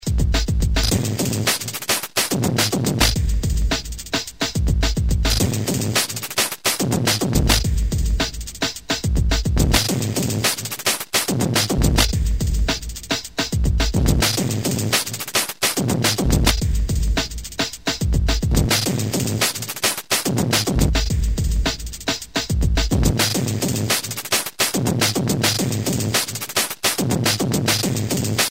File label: drums octave up